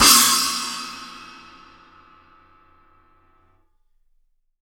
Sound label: China 14 FF, Drums; Big Fish Audio; Ross Garfield Drums VOL-2; SHILOH KIT